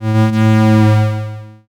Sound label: creak